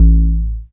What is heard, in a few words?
BAS FM DX-7